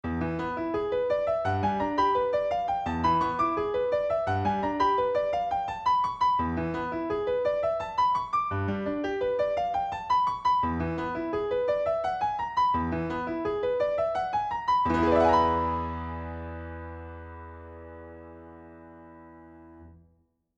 piano harmonics

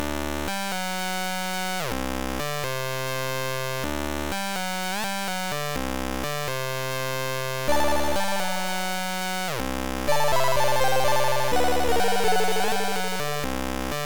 ocean, chiptune

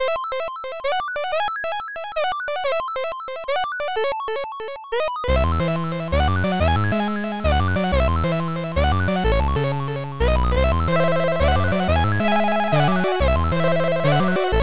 morning, chiptune

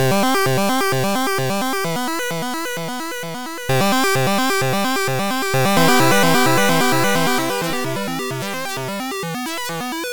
shiptune, chiptune

